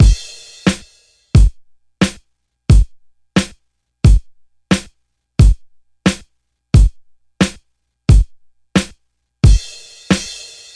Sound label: 89 bpm breakbeat sample G sharp key, kick tuned to the G# note; drum loops